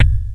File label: K5 kit, Kawai; Rubber Chicken Software Sample Bank